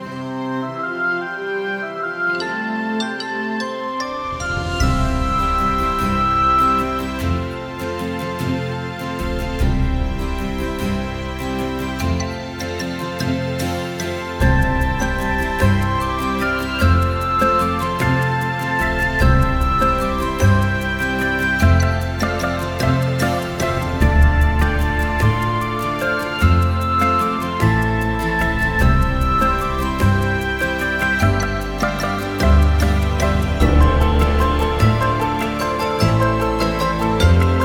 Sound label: main menu theme looped